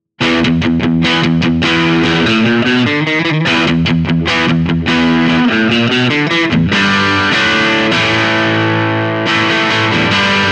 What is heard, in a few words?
characteristic sound; jensentone